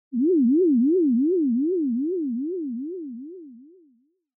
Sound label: Fantasize Long Mid; シーン・妄想